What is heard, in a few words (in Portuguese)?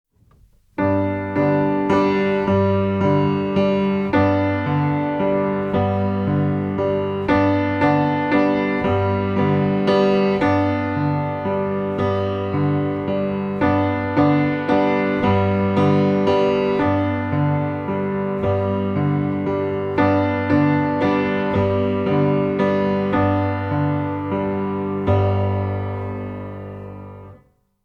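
Improvisação 1 - Cordas SOL e RÉ, Violino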